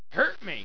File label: scream